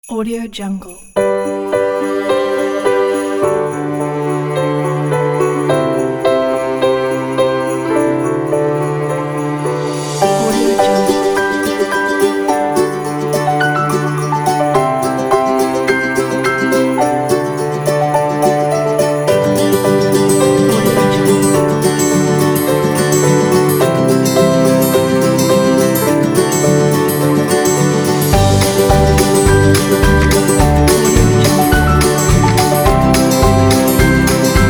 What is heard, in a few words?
christmas beat